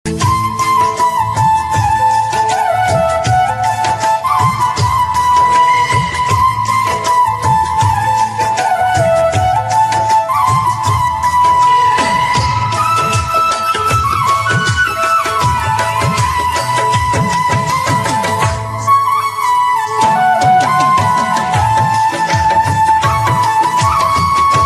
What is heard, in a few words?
Flute Instrumental Ringtone